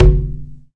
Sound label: urban trap percussion deep; Urban Trap Kit; Hip Hop; STYLE KITS (Hip Hop, Etc)